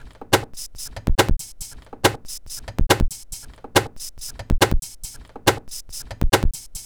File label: dist and ruler 40 BPM